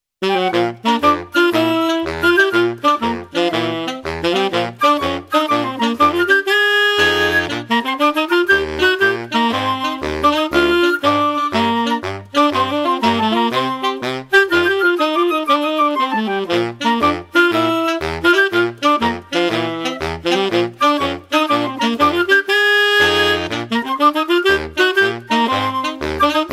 TV theme jazz band, Sax; oxfordshire

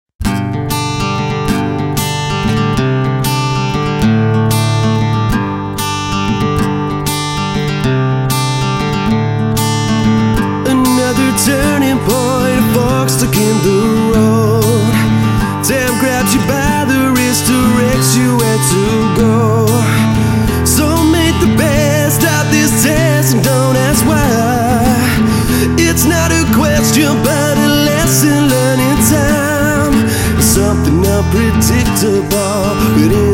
Acoustic Duo; Hampshire

